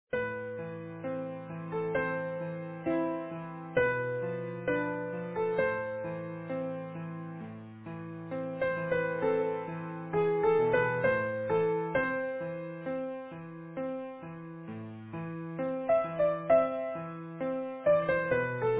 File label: easy piano